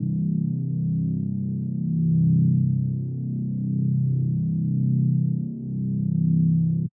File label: reese, 808s